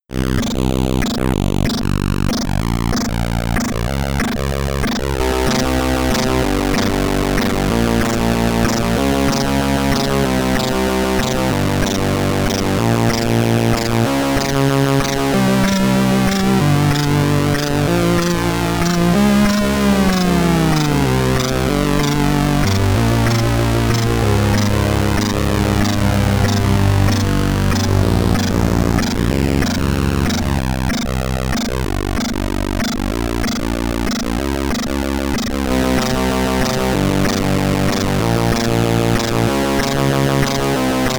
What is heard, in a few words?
SID Stereo